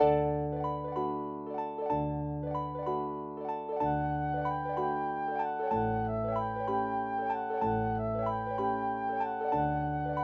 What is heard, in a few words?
barcarolle, flute and harp; Serenades for Flute and Harp Vol 1; from the tales of hoffman